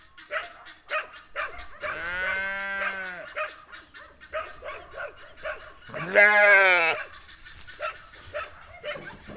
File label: sheep baaing dog and goose in their background